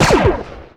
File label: pistol; scout